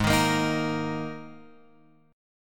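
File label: Ab Suspended 2nd chord, Guitar, Open F tuning; x,3,3,5,6,5; strummed; JGuitar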